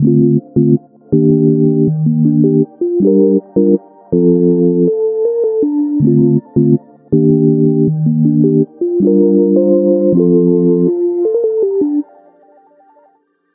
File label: Gm 160bpm; Satirist